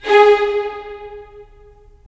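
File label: strings violins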